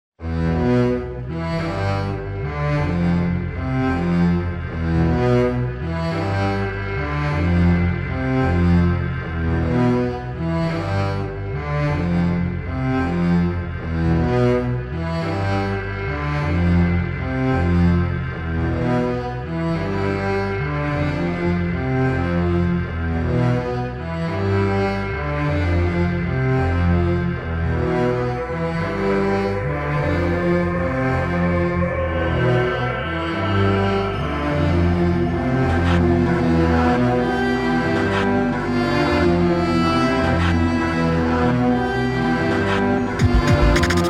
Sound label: Cello Trailer; Assassins Creed